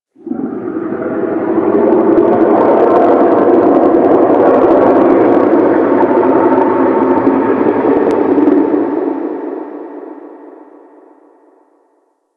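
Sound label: Distant Moan One Off, Shipyards; Warframe; Grineer Shipyards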